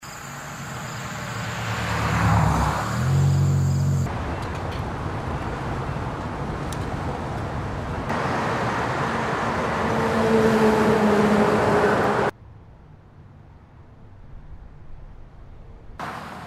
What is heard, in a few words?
traffic sound fx